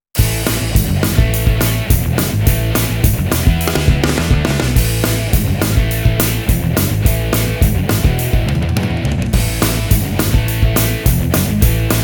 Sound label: Hard Rock; Realsamples